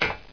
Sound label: ladder, player; mistake coop